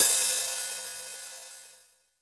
HiHat, Roland R-70

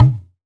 Dance Drums; Best Service ProSamples vol.15; AKAI

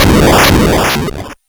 Game Boy FX Noise, chiptune; Nintendo Game Boy

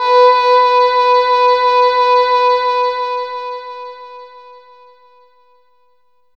HORN; East West - Phat & Phunky

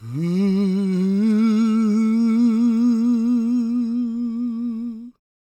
GOSP MALE, SOLO; Spectrasonics; Vocal Planet; Gospel

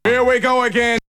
Vocal; ZERO G - Datafile DISC 1

